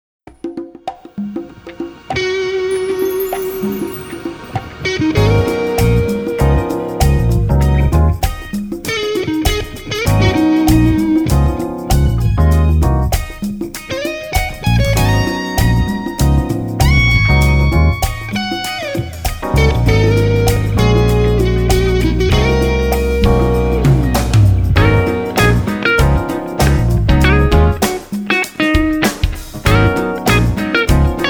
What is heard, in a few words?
Jazz Guitarist; Atlanta